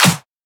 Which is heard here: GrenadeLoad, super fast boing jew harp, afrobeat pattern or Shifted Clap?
Shifted Clap